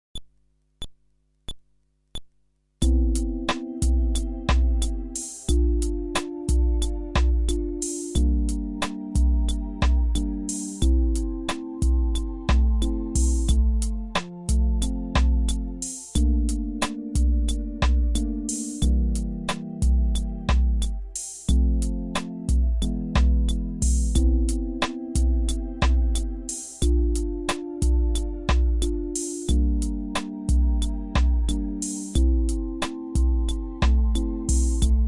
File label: Groove ベース無し